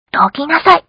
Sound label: rock